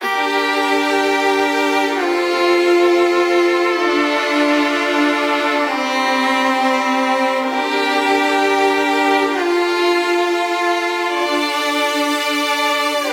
Solo Strings - Dm 130Bpm; Construction Kits; STEMS; Ghosthack; SkyRealm; Kit 24 - Dragon Mountain

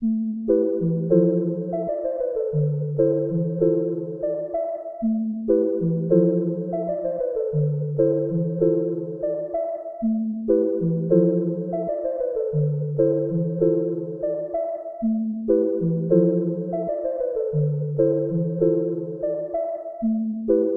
96 BPM A Min Pad; Melody; Loop Stems; Cymatics; Infinity Melody Collection (Preview); Rooftops

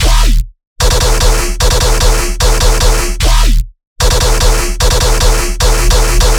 Bass Loop D# 150BPM; Dubstep; Fusion; Ghosthack; Advent Calendar 2022

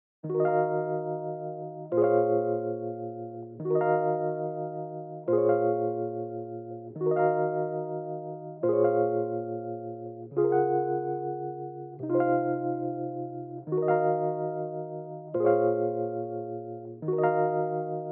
143 BPM A# Min Rhodes, Hip Hop; Melody Loops; Loop Stems; Cymatics; Americano

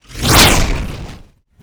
wooshes transform sweetener

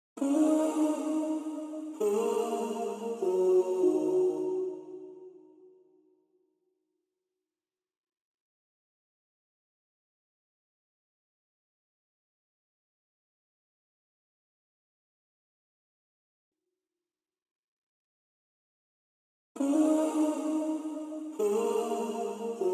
99 BPM C# Min Vocals; Cymatics; Monogram

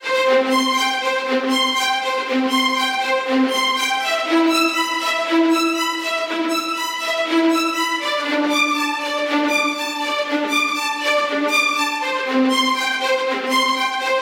Viola Ostinato - C 120BPM; Construction Kits; STEMS; Ghosthack; SkyRealm; Kit 11 - Warriors Cry

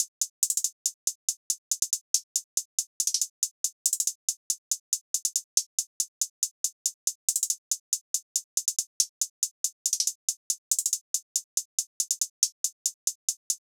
Drum Loop - 140 BPM Hihat; Loop Stems; Cymatics; DOPE DRUMS VOL. 1; Pluto

